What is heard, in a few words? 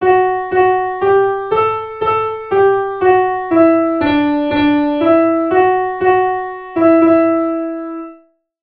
4 Bar Phrase